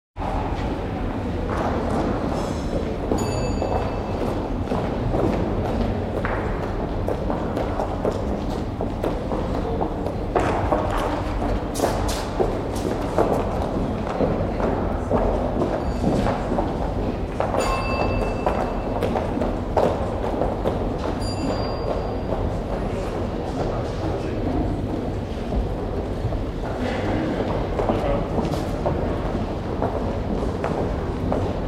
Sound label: Medium Hotel Lobby Crowd Ambience; Sound Ideas; Series 2000